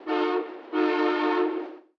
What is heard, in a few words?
Train Passing Idle